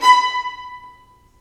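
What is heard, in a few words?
STR, Roland String Master Series, Violins Marcato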